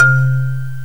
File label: Marimba; GMPlay; Chromatic Percussion